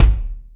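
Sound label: BIG BASS DRUM; Greytsounds; Scott Peers Sound Engineering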